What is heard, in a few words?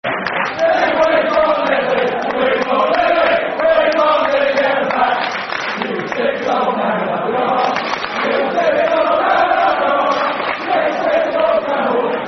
Football Chants (Soccer Song); Ledley At The Back; Tottenham Hotspur Thfc